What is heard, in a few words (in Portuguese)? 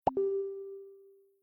notificacao